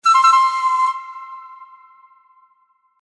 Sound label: correct; 和風のピンポン×2回。その２。; 正解の音・ピンポーン